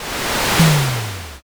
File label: fire magic